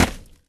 Sand Impact Bullet

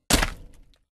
Sand Impact Bullet

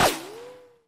rinochet

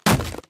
Wood Plank Impact Hard

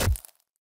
Flesh Impact Bullet

